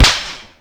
Fence Hit; New Super Mario Bros. Wii

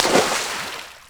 Splash

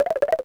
Bone Fish; New Super Mario Bros. Wii

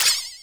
Magic Deflected

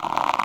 Vine Swinging; Wii

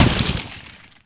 Volcano Rock Smash; Wii

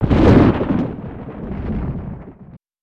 Thunderbolt; Pikachu; Super Smash Bros

